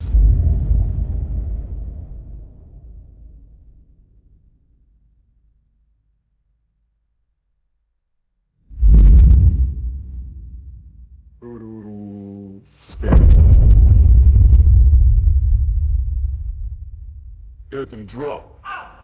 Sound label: deep resonating stuttering booms